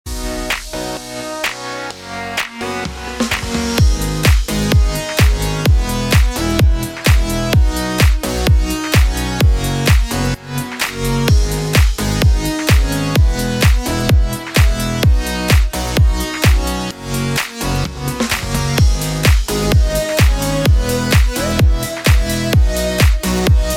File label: BED ELECTRO HOUSE G#MIN 128BPM